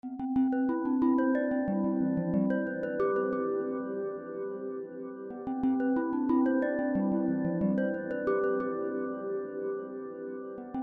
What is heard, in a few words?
Calm